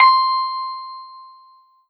Alesis Sanctuary QCard Music Box C6

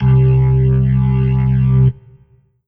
Korg TR Rack Velocity Organ C2

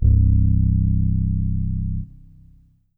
Korg M3R Fretless C1

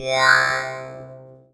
M-Audio Venom Stranglead C3

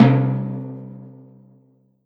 Korg NS5R Timpani C3

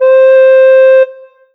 Alesis Fusion Recorder C5

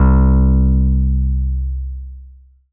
Ensoniq ESQ-1 Electric Bass C2

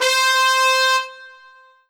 Korg TR Rack Orchestral Brass C5

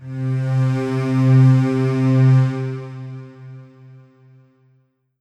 Korg TR Rack Slow Strings C3

